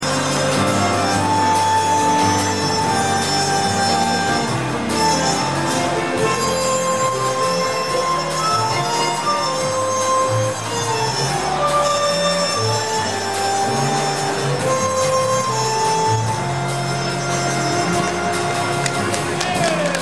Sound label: jazz; kouka